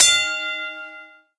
taunt sfx bell single; video games; source engine; tf

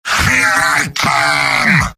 battlecry; heavy; video games; source engine; tf; mvm

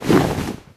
get up; video games; player; taunt; luxury lounge; source engine; tf

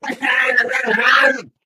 ballbot drowning

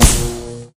batsaber hit world; tf

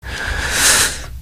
inhale; episodic; episode 1; gman; Source engine

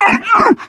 charger lurk, voice idle

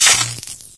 new spark, energy; source engine; ep2

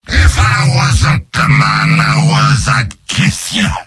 demoman cheers; mvm

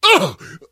hurt major, survivor voice

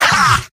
laugh short; demoman; video games; source engine; tf; mvm

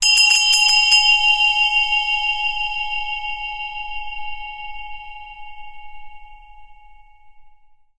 zen bell; Source Engine; tf; japan fundraiser

